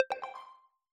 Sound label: disconnected